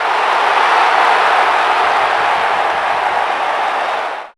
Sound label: Cheer; GTA - Long Night